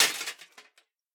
armor hit; minion battlefoley; smite; npc; roman archer

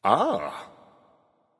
grunt; Smite; bacchus

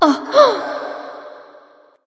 surprised light; smite; voicepack; hel

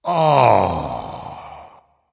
sigh dragon; fafnir

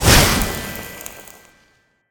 impact; smite; medusa

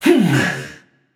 grunt attack, voicepack; smite; jorm

